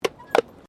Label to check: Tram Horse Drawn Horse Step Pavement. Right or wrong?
Right